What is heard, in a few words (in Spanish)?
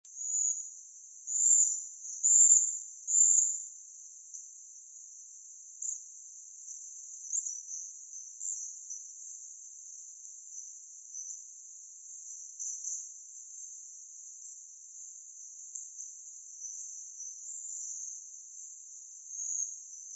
Picochato Grande, Tolmomyias sulphurescens, Yellow-olive Flycatcher; cantos